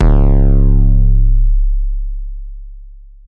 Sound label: Long Kick 808 C; 808 Day